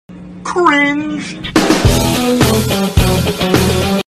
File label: Cringe Music